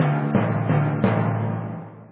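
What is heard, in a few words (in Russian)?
литавры мелодия волнующая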